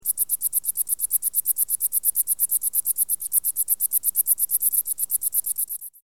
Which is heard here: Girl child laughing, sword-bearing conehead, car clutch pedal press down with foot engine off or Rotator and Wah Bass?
sword-bearing conehead